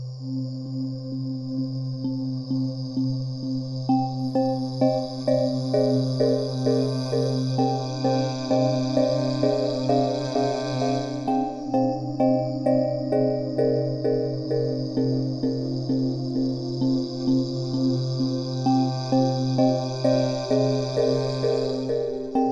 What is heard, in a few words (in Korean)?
Tension 공포